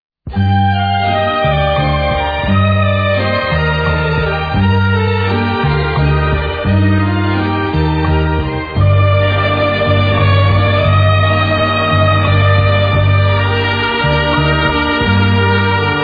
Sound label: Waltz, 29 bpm